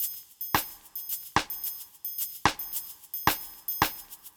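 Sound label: Bossanuevo, drums, downbeat electronica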